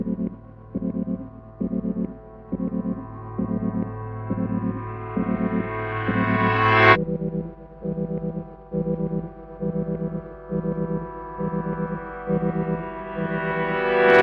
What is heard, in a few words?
keys, Loops, Sad